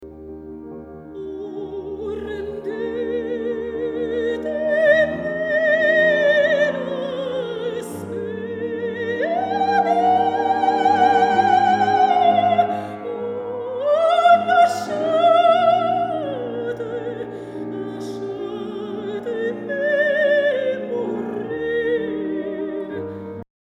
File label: Portamento